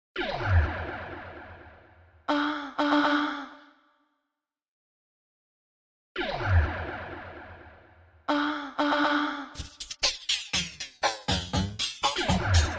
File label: female voices beat